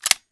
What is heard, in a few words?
clip out; brainbread